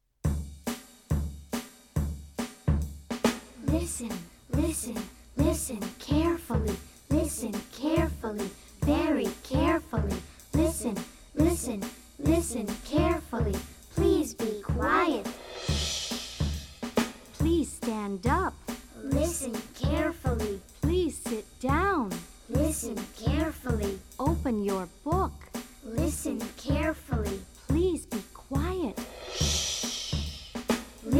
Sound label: Chant listen carefully group